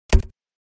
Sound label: Click error